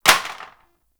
Plastic Drop; Warframe; Ambience; Nineteen Ninety Nine

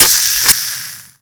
Tenno Animal Foundry Detox, machines; Liset Machines

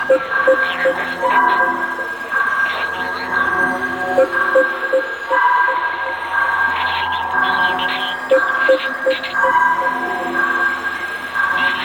Beacon Loop; Gameplay; Warframe; Jade Shadows; Ascension

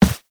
Run Default, Footsteps; Warframe; Operator

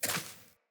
Sparrow Step Default, Footsteps; Animals

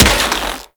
Infested Rifle Charged 2D Shot; Quanta; Weapons

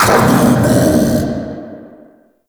Uriel Brimstone Cast Vocal; Warframe

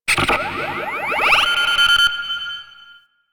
Mine Detonator

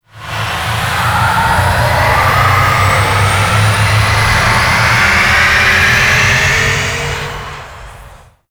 extraction depart, vehicles flight; Warframe; Liset; Stalker Liset Skin

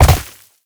Land Vegetation; Movement; Warframe; Uriel

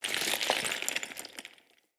Amb Ruin Stone Crumble; Ambience; Mendicant Area

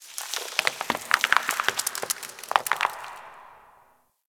Ocean Rock Crack Small; Warframe; Grineer Ocean

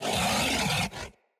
Kubrow Feral Vocal Melee Attack

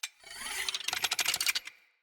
Bow Charge; Sinecure

